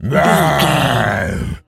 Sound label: zombie voice grunt